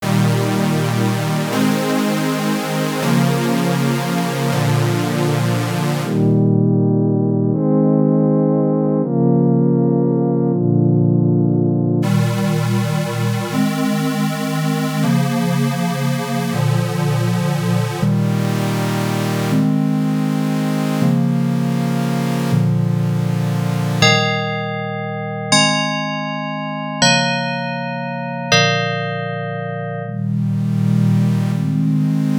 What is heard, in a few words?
Pads